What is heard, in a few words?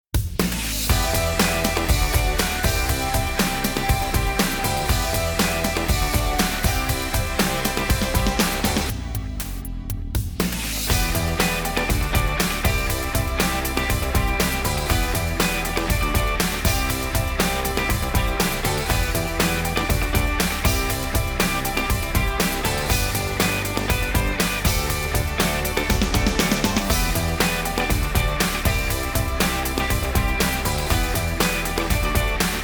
Theme into Bed; Sitting Duck Music; Good Morning Britain